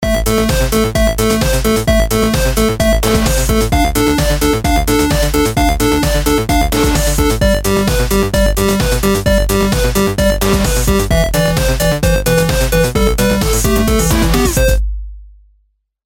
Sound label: retro game ringtone